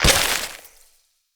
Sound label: Grunt Death Cabbage Growth; Enemy; Corrupted Sproutfolk; Combat